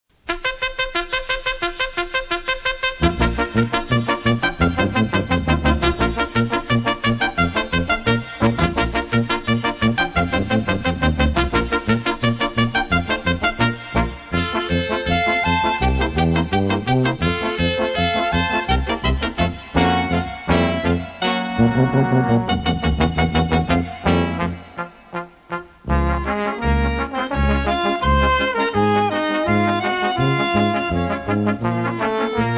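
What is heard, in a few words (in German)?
dorfmusik ländliche Tänze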